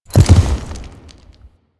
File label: Horse Jump Land Heavy; Foley Actions; Warframe; Vehicles; Duviri